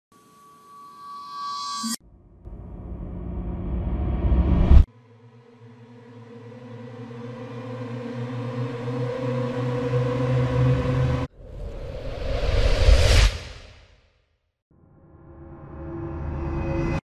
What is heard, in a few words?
Riser; هیجانی رایزر